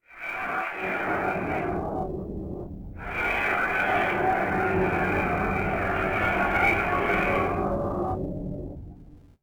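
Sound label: Ambience Voices; Xbox bios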